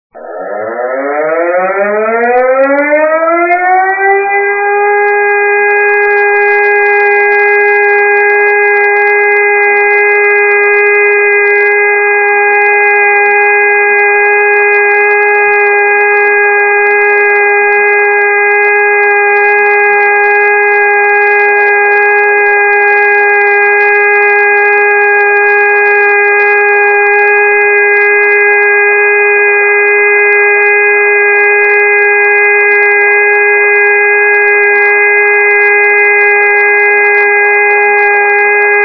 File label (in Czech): zkouska siren; krize